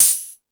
High Hat, Drum Machines E-MU Pro-Cussion

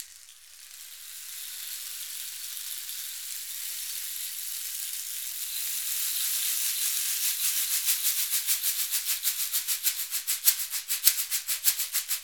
RAIN STIC; Latin Drums & Rain

